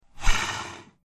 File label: Slow Trackball Spins, mouse